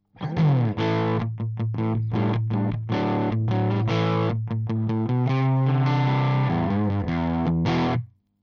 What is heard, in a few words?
crunch Position 3 KSM313 back